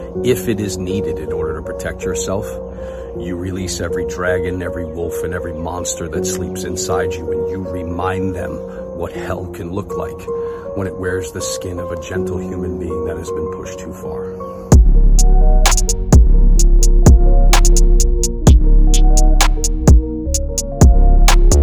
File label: hell trap